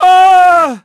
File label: fall; PHANTOM; Pulse Racer